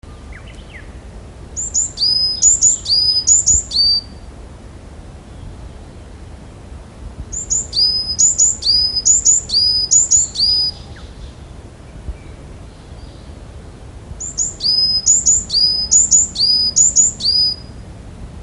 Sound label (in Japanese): yamagara